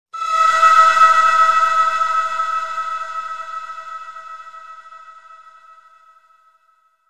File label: airhits; dzwonki